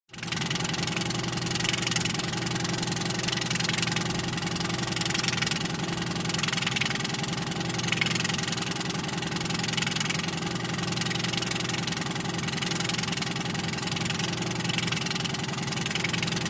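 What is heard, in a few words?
Outboard Motor Int Idle, motorboats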